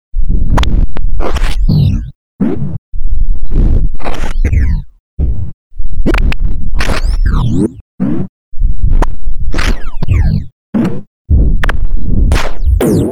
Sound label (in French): passages de moustiques